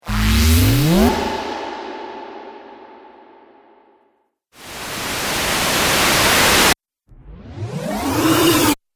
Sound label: Cinematic Riser Pack; Rise And Dive Sound Effects